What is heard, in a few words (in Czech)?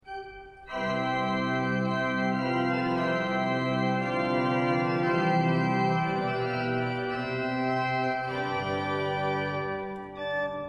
echo improvizace, varhany; Varhany na kůru postavil v roce 1775; kostel sv. Petra a Pavla ve Žluticích; František Prokop Nolli